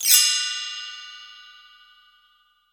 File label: BELL TREE, Latin Hand Perc; E-MU Producer Series Vol. 2; More Studio Essentials; Composer